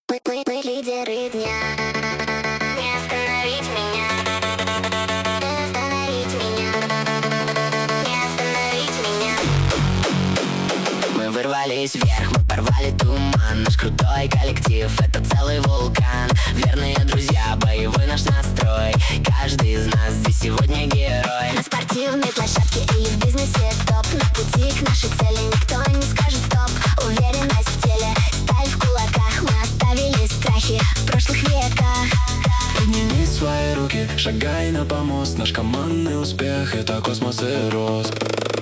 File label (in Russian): muzyki dlya sporta